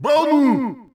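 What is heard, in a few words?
men yells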